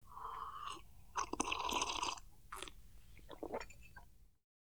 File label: Slurp Liquid